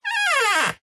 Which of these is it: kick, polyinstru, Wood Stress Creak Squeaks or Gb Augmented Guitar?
Wood Stress Creak Squeaks